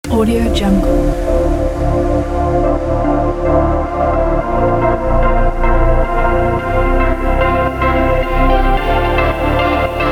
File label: energy tune